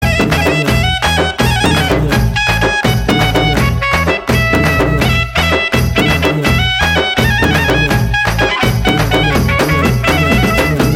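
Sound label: background music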